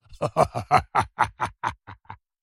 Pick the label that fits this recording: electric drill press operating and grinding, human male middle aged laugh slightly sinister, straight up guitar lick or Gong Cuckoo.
human male middle aged laugh slightly sinister